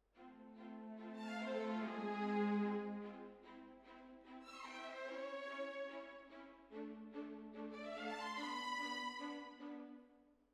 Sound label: Hall, Jazz for piano